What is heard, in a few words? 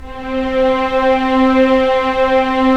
STR SC, Roland LA Composer; Roland - LA Composer vol. 4; Full Sec